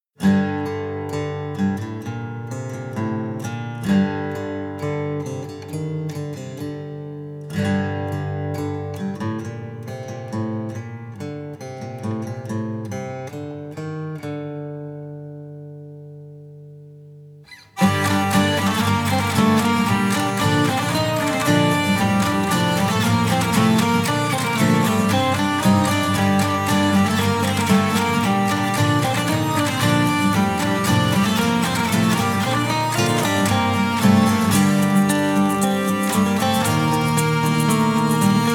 acoustic

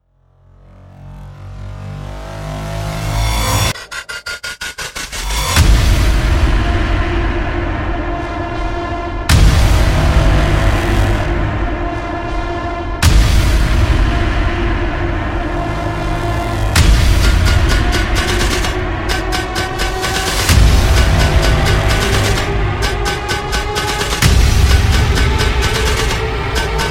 trailer backends hell grenade

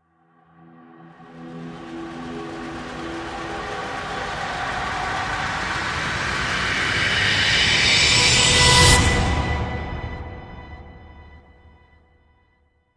risers interstellar

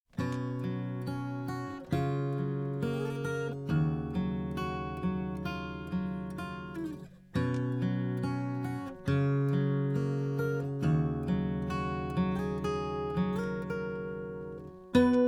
acoustic

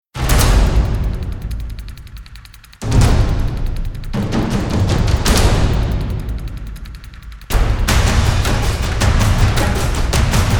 percussion only version

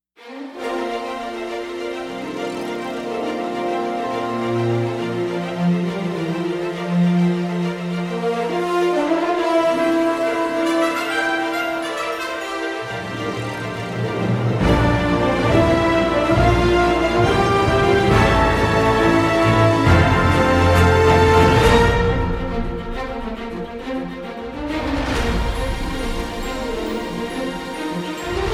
light perc

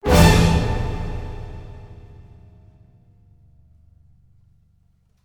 orchestral hit

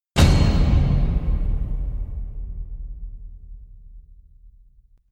metallic slams; iron fist

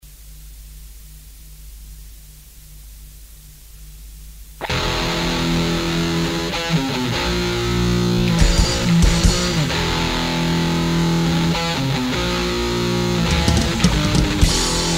instrumental

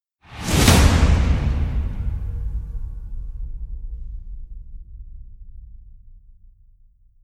whacks thunder clap with whoosh

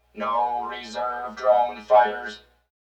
drone chatter sound fx

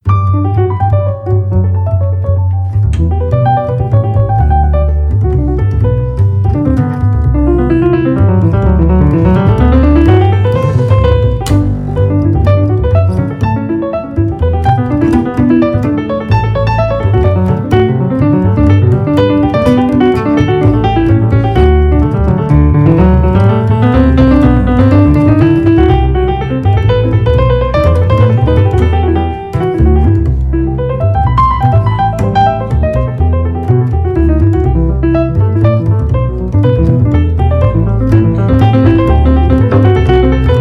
string trio

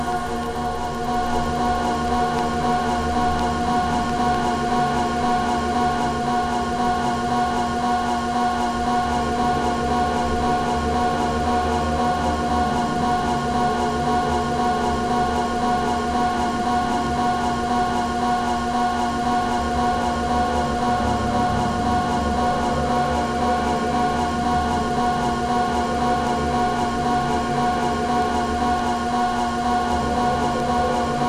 sub dub soundcheck

